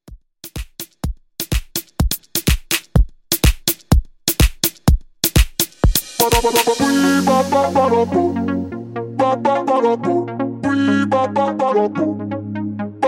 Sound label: Club Moombaton 125Bpm